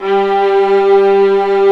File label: String Vlas Sect Agitato; Vas1 Agitato